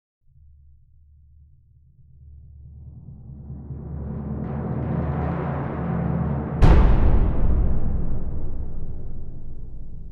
Cres Timp Hit; BlueFX; Bonus - FX & Transitions

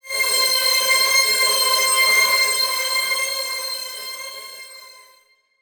Title Transition Feedback High Pitched; Production Element

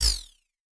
Multimedia Internet CD-Rom Flash Hi-Tech

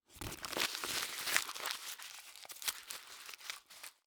Household Paper Crumble Wax Paper

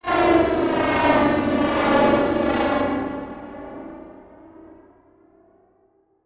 Transition Whoosh Warble; Production Element; Title Transition; AdobeCC

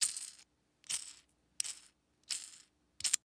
Industry Tool Wing Nut Tighten

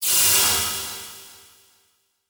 Title Transition Feedback Blast; Production Element

